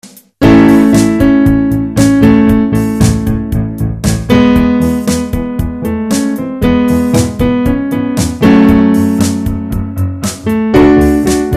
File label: piano A korablik